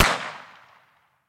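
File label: unexpected firecracker shot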